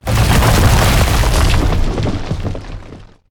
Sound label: Rock Throw Grab; Evolve; Goliath